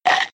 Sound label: raptor bite; Henry Danger - Day Of Danger!